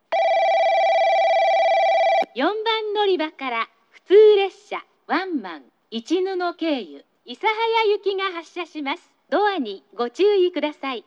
nagasaki local isahaya; 4番のりば発車放送（普通・市布経由 諫早行き）

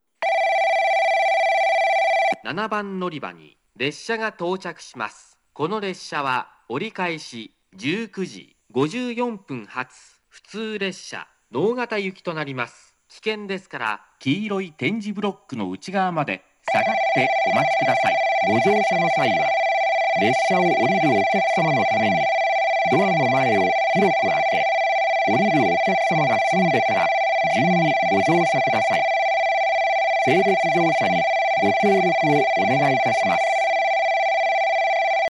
orio local nogata

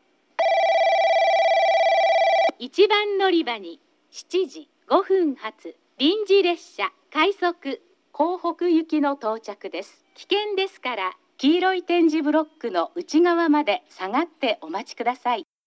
balloon; 二日市駅 接近放送（快速 江北行き）

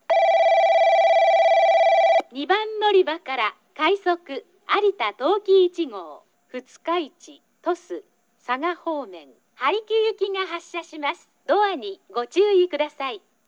minamifukuoka rapid arita tokiichi; 南福岡駅 発車放送（快速 早岐行き）

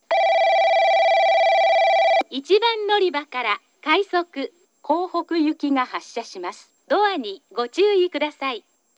balloon; 南福岡駅 発車放送（快速 江北行き）

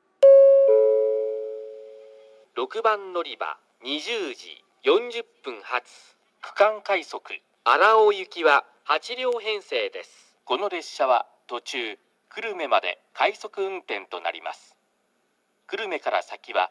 tosu regional rapid arao; 6番のりば案内放送（区間快速 荒尾行き）; 鳥栖; 久留米まで快速

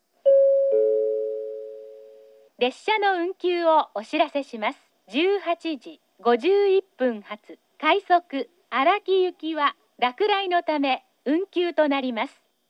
rapid araki; 快速 荒木行き; かもめ21号 長崎行き→肥前山口行き

